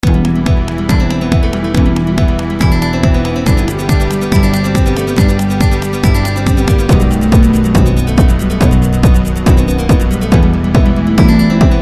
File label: techno